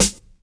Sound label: KIT, Dance Mega Drums; Best Service